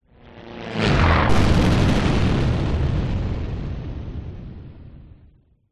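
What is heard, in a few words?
plane light prop crash explode exterior; vehicles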